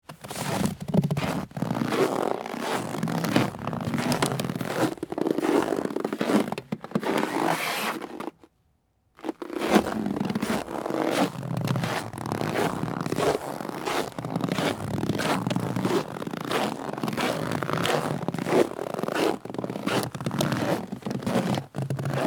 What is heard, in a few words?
footsteps snow; foley